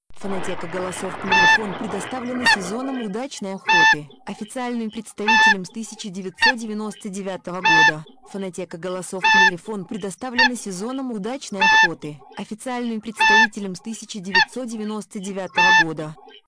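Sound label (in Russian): Лысуха, манок; plurifon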